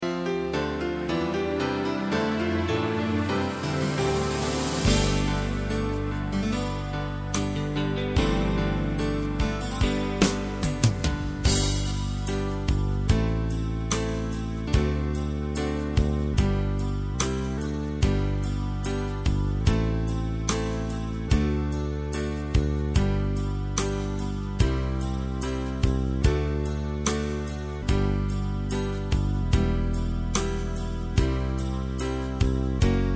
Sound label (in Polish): sluzebnico panska instr